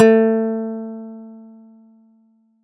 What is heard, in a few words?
soundboard